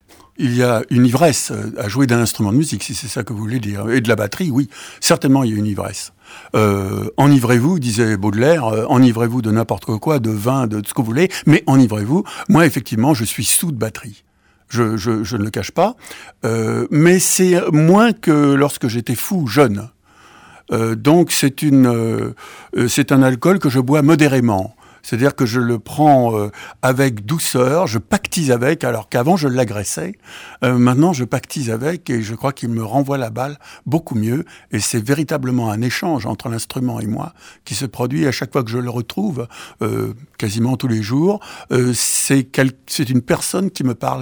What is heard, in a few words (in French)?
solo de batterie; L'ivresse d'un solo de batterie